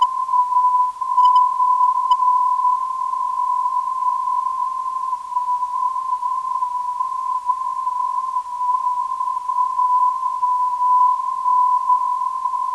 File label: 310kHz, ndb